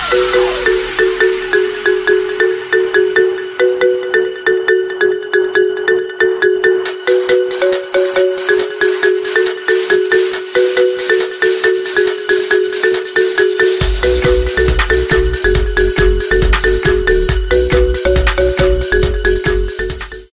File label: marimba i phone 7 plus ringtone